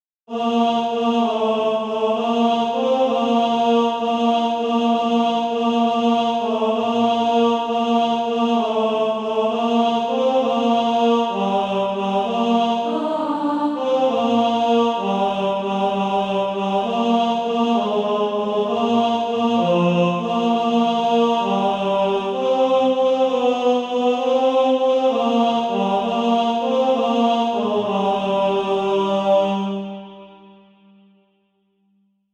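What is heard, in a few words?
tenor, SATB Practise Music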